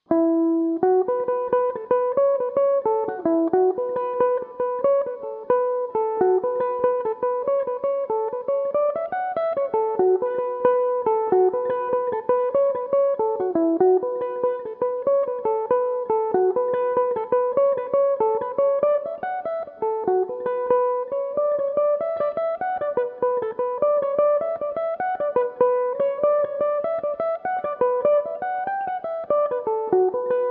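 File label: B minor, irish mandolin, tunes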